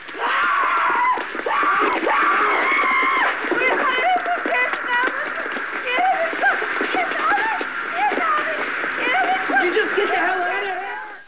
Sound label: scream